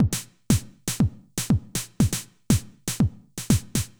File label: House Drum NoHH Reverb